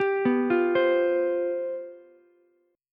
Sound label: G 11th, Ukulele; G11 arpeggiated